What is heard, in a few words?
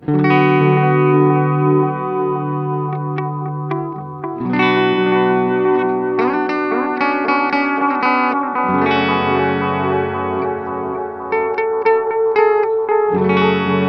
Echosex 3; Gurus; Mein Lieblings Setting